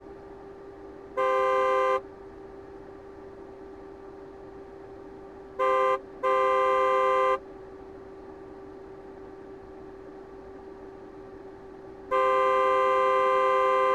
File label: aston martin db9 horn various engine intake left; dpa4062; sonniss gdc